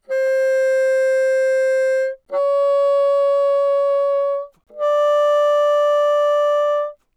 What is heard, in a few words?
Bassoon ff C5 D5, woodwinds